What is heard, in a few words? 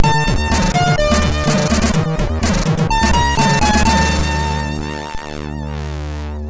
Jingles, MOS6581R2; Freeway 86